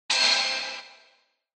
Bingo Machine Digital Start Speaker

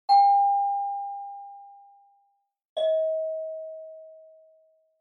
Doorbell Ding Dong

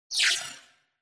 Cyber

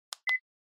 Cell Phone Hardware Button Beep

